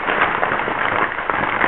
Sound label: gravel; stunt rally; surface